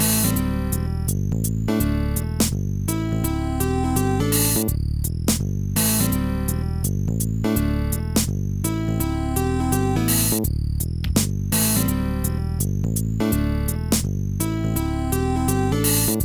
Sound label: jazzy groove; Trackers; Modland; clue